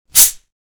soda fizz; drinks

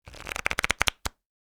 shuffling cards

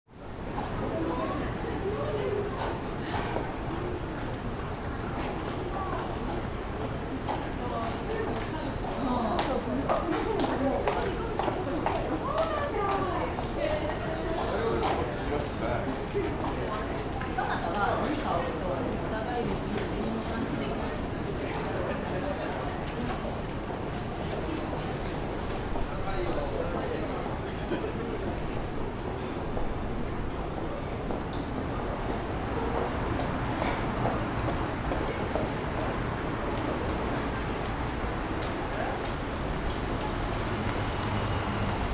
street alley ambience

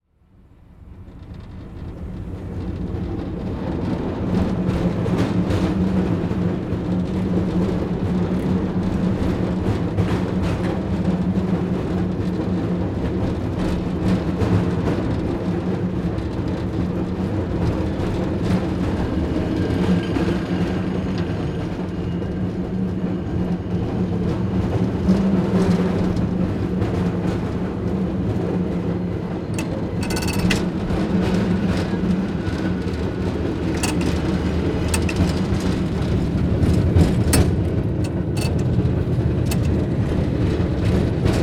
cable car ride; transportation

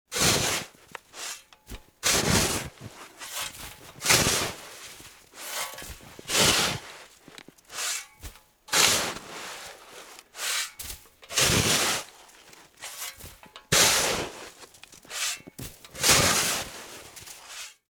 shoveling snow

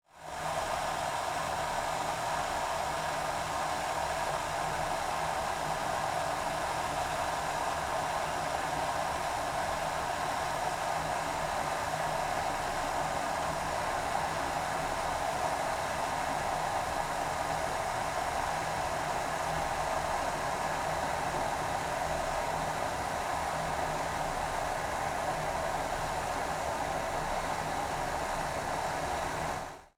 pot heating up, kitchen

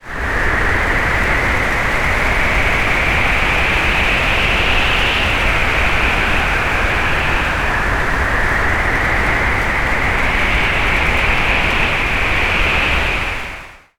hurricane; nature

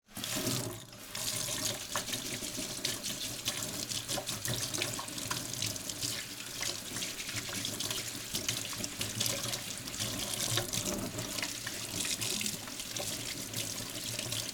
washing dishes

